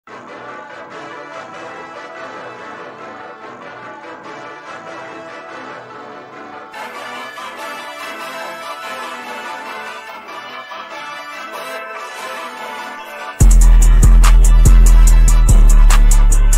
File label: INSTRUMENTAL